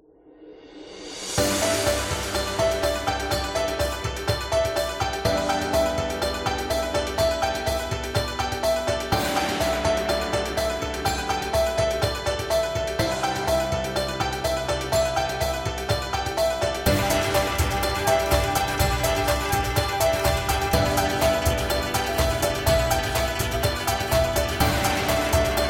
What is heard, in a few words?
Asian World; sounds of the world